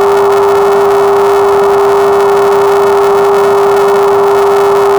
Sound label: wave 400Hz phase random per cycle, 400Hz＋4倍音の2倍音、3倍音の位相をランダムにずらした音（ノイズと基音が聴こえる）